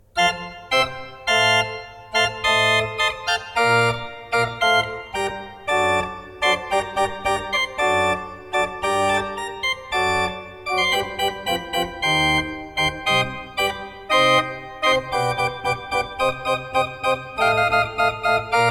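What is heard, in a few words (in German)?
Tzschoeckel 5 I, pfeifenorgeln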